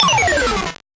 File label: Noise A, 8-bit, Bitty Noises; musicradar; 8-bit bonanza